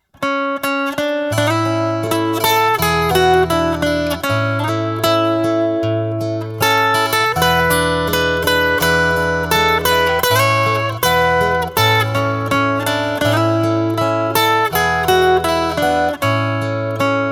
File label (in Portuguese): violao